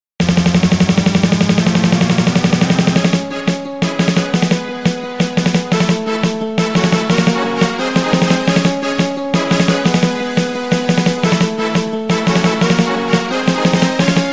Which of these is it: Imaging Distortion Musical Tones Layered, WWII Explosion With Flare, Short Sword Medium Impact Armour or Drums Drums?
Drums Drums